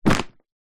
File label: household plastic pillow or duvet bag set down on carpet